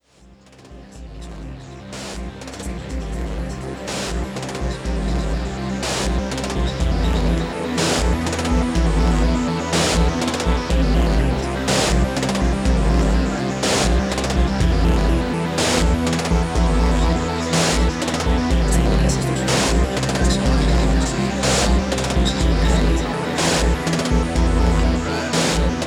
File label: Voices